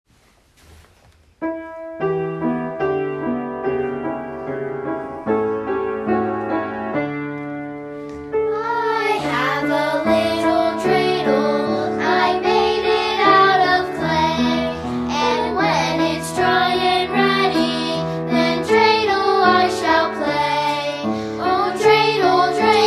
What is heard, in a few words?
I Have A Little Dreidel vocal